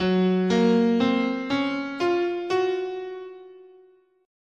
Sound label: F sharp Chinese, scale